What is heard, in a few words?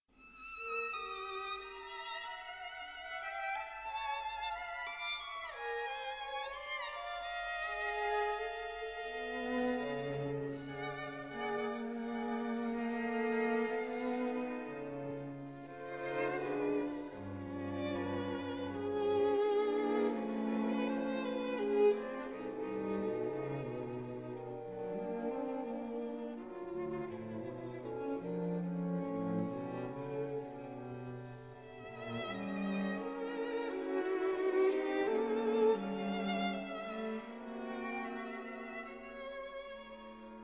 String Quartet